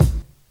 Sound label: nineties hip hop kick g sharp key